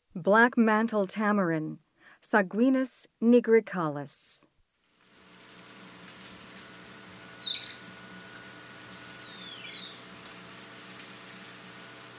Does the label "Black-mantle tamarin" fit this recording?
Yes